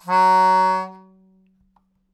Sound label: Melodica Long, Long Notes Chromatic